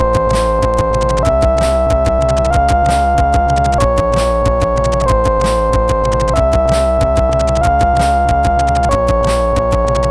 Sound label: Trance, CSG8580R5